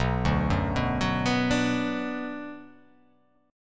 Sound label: B Minor B